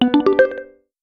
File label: correct positive answer